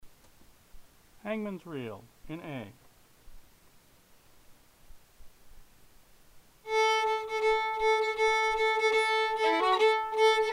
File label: in A fiddle; Hangman's Reel